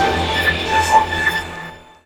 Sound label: Calm String Hit; Jungle; Blu Mar Ten - Jungle Jungle - 1989 to 1999 Samplepack